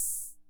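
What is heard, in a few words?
Ace Tone Rhythm Fever open hi-hat, Drum Machines; 300 Drum Machines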